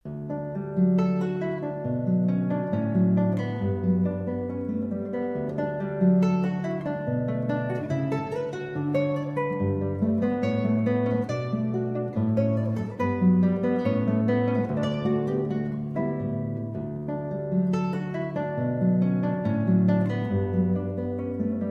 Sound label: groove